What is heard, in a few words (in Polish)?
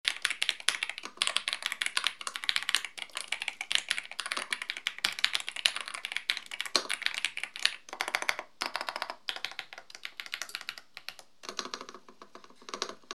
DynaTab 75, klawiatury; Epomaker; bezprzewodowej klawiatury mechanicznej